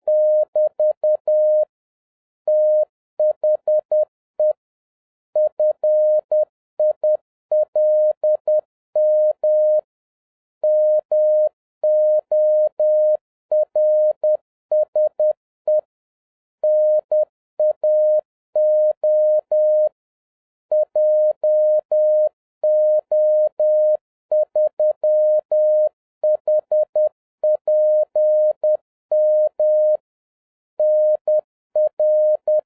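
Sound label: Morse code, 10 wpm; THE FILM MORSE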